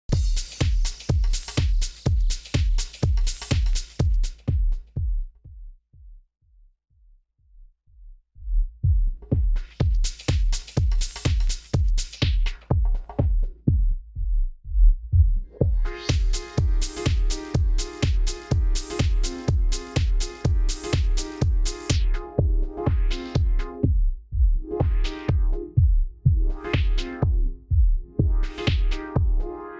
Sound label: Lowpass Filter; Novation Twitch